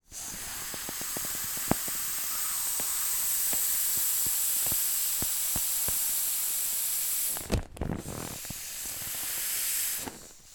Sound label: toy bop bag defalting squeezing air out